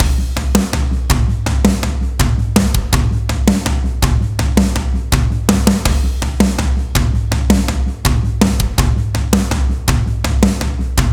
toms on; df xcite 2; drumforge

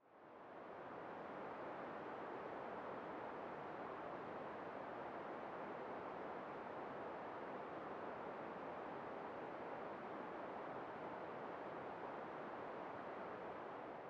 city ambience; noisepalette; sirenfx

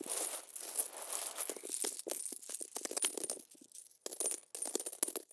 payment method coins metal; spare change; nova sound

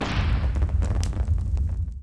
light flames fireworks; nova universal fx 2015; nova sound

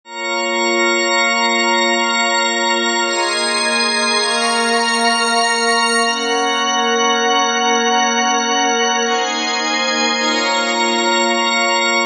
pad; fire by music sound software

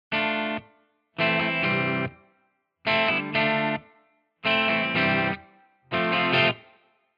free les paul; chiqui audio

